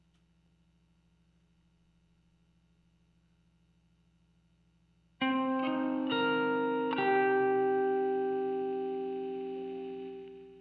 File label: gtr texture